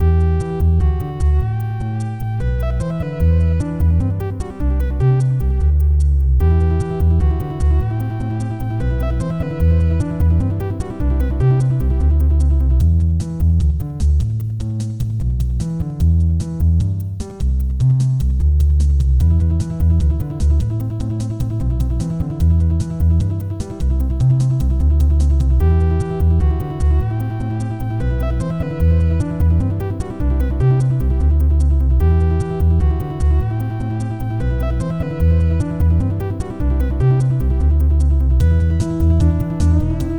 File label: boss fight; sven; good box